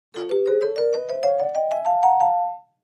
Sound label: Clavichord Ascendin